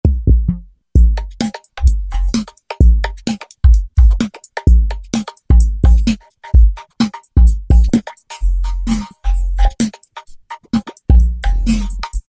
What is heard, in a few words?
House & Ele; Factory Patches